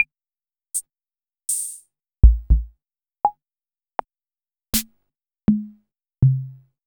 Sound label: Acetone Rhythm Ace FR6; Sliced